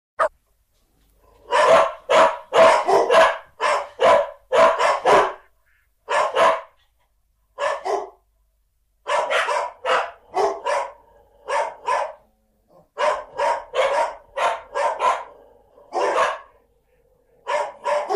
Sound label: dog barking